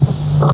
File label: tape off drum